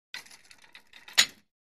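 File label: Gym Weight Equipment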